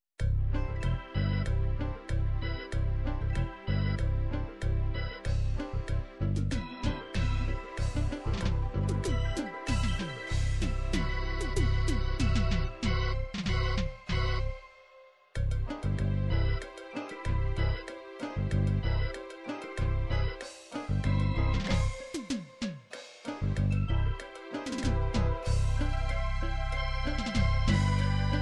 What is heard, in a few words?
latin cumbia, midi